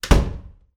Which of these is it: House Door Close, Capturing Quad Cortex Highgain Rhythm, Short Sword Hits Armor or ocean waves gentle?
House Door Close